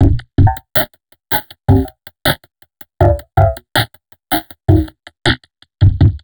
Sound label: Drum Loops Crazy Processed